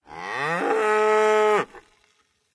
Cow Panic

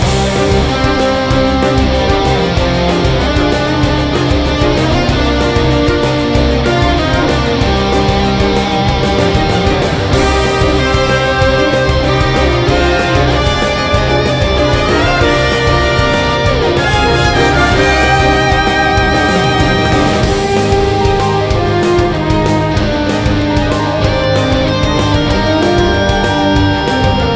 Boss Battle Loop

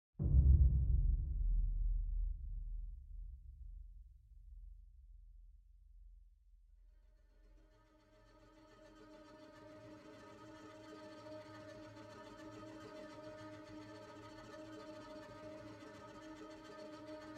String; String Thing 3

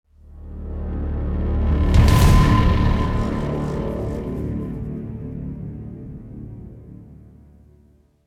Action Low Clear; The Dark Knight Rises; Android, iOS

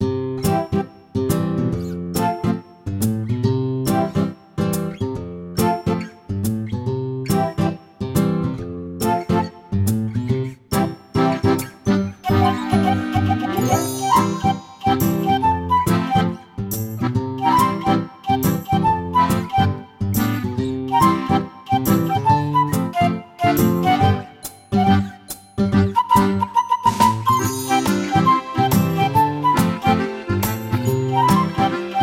Bgm Game Music; Farm Mania

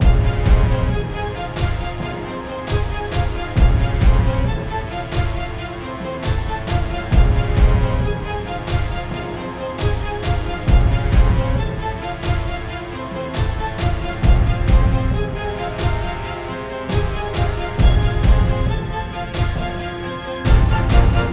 Boss fight; the legend of shadow mask of the force; masked

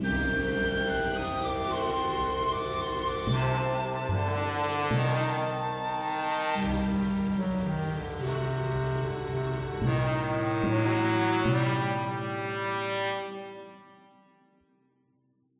Danger Melody; Maharaha

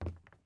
plastic step; teardown; ps5 windows xbox series xs

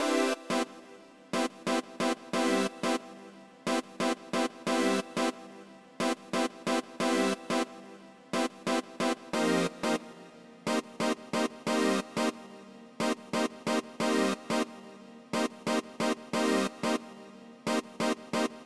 Polystacks

